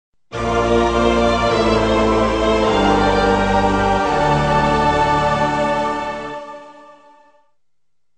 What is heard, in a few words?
Item Fanfare; Metroid